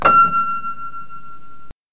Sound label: Piano